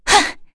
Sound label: Vox Jump; kingsraid; en